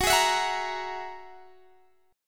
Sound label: G sharp 7th Suspended 2nd Sharp 5th